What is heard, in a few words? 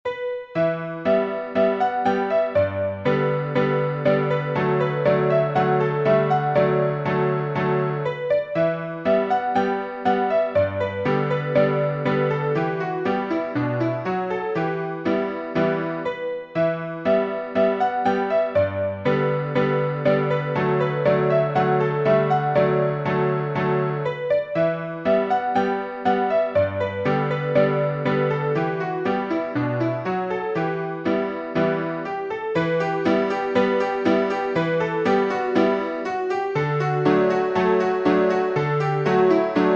piano